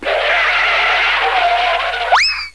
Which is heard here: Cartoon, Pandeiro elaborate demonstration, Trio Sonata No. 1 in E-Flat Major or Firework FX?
Cartoon